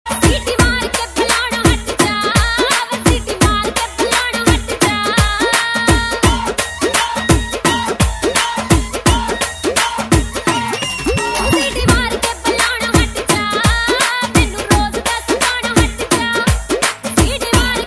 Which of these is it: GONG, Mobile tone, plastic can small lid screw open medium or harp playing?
Mobile tone